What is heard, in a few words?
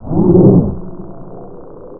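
scratch, turntable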